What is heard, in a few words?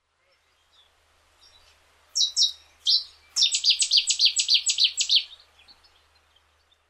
cettis warbler; Rainham